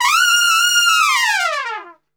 F 4, Brass Super Section, TRP; TRP LNGFLL; Best Service

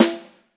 SN2FF, orchestra hits; Best Service; Peter Siedlaczek's Advanced Orchestra

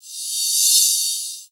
rev crash

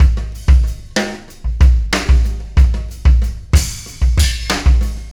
Beat, Loops

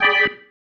Stab One Shots, Organ

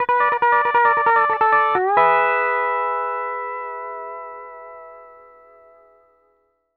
PD LOOPS 140, Whole Lotta Country

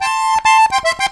Accordion Lik Am; Zero-G Kocktail Kollection; Accordion Lix

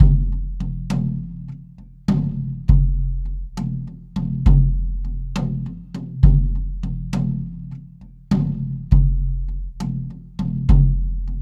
Java Lom Bak Drum, Ethnic Percussion; Sonic Foundry (Sony Creative Software); Ambient Atmospheres & Rhythms

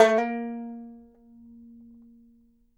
BANJO NOTES, BB3; Sampleheads; Whole Lotta Country 1 & 2

